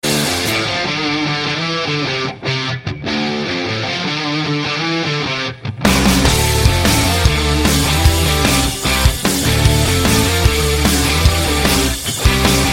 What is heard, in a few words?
rock guitar